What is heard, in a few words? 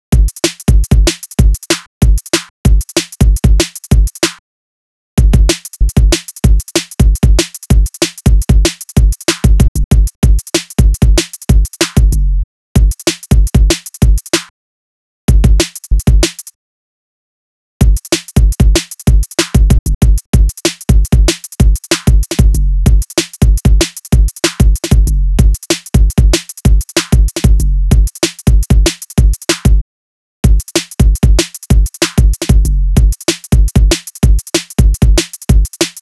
drums